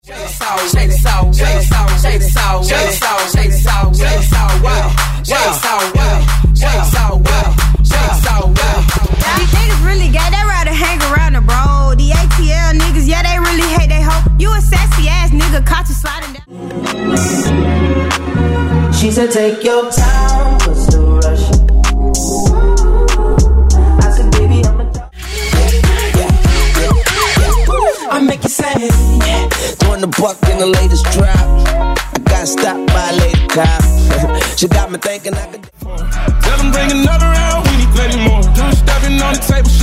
Hip Hop; Top40; Megamix

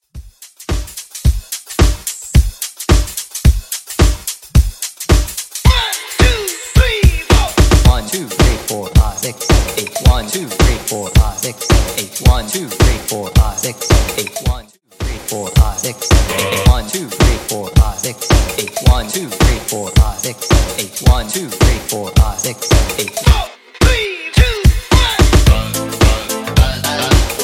80s Dance 109bpm

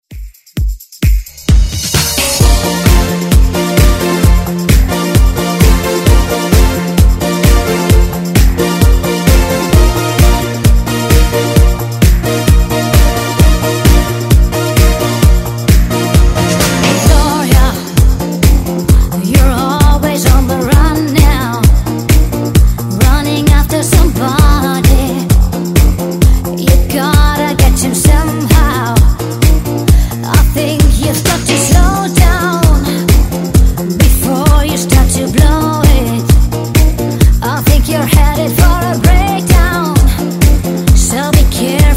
Dance Redrum

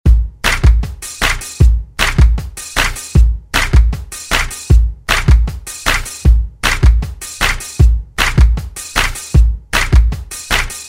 50s Redrum

